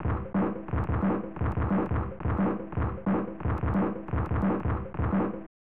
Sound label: Drumbeat; Kick Off; Super Kick Off; SNES